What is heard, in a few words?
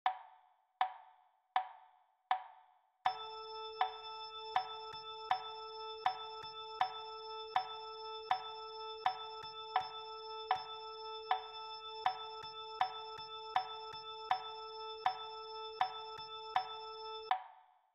Rhythm Practice A note; Unit 4